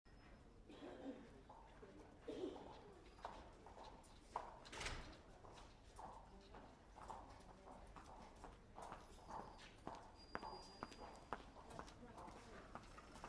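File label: Hospital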